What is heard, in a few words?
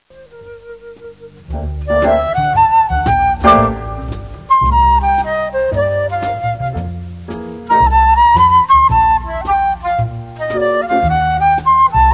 flute; Jazz Conception for Flute